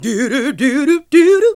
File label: GOSP. Singin; Sound & Vision - Gigapack II CD 1 (Roland); HAL Gosp.Singin`